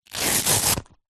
hospital blood pressure machine arm band velcro rip